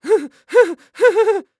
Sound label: Cartoon Human Male Laugh Nervous; AdobeCC